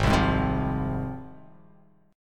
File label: G sharp 6th G sharp down, chord